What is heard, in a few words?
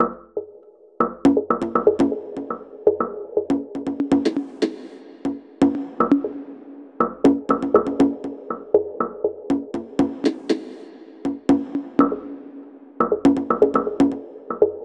perc